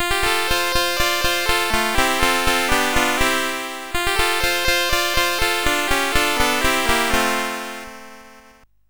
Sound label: sid, CSG8580R5; Aquantor